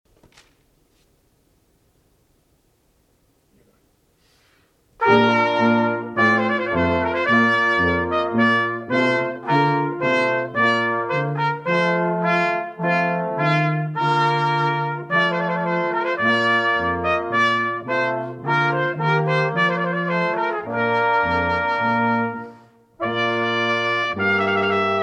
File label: trumpet voluntary